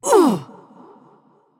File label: Grunt Hit; Princess; Paladins